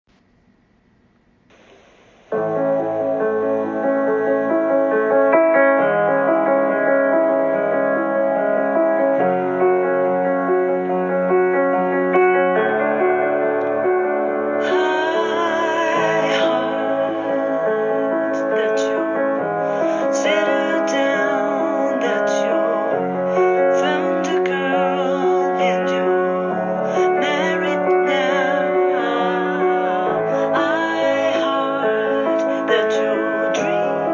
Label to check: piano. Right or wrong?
Right